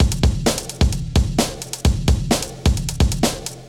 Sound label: Drumkit; Ableton; Factory Packs